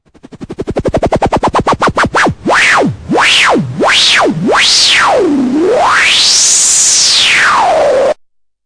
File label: noise MiniBrute, Noise Sweeps